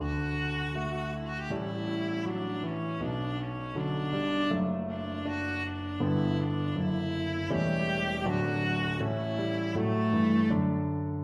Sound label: sonata, viola